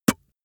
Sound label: spit; soundpool